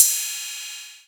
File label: cymbal ride; Playdate SDK; MIDI Player